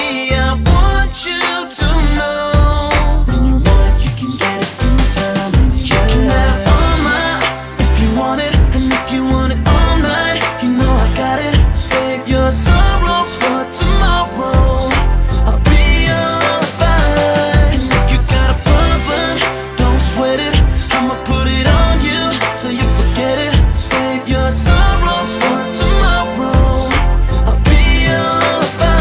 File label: lullaby